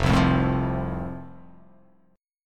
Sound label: F Major; strummed